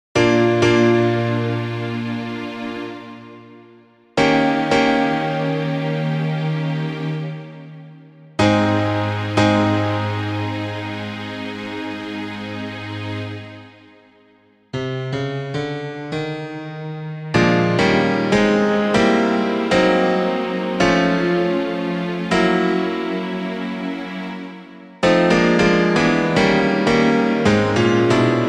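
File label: instrumental; Music Man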